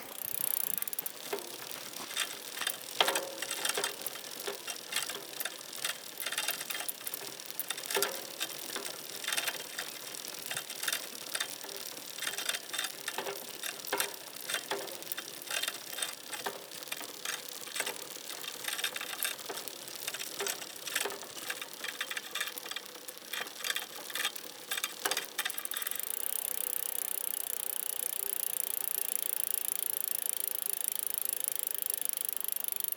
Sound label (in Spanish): BICICLETA EXT CADENA Y RUEDAS GIRANDO